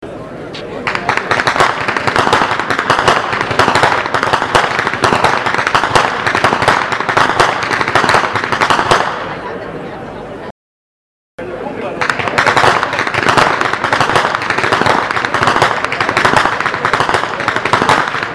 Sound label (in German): schnalzen; eham